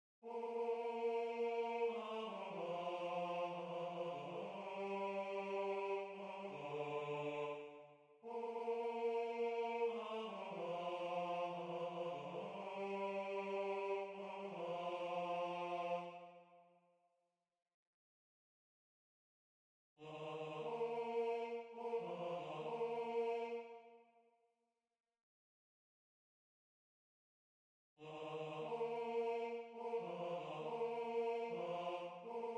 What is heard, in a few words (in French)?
basse